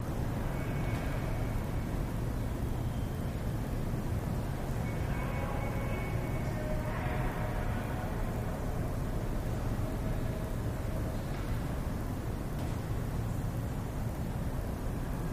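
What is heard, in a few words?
Prison Roadhouse Exterior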